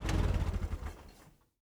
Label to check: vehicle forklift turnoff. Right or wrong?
Right